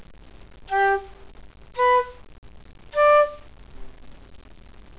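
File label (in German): flöte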